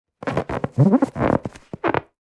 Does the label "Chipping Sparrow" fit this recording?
No